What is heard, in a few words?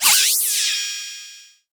Charge; Chaser